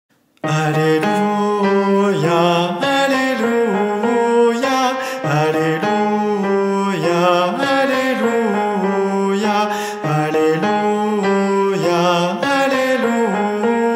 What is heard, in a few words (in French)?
Alleluia Guide voix Altos; Missa Pro Europa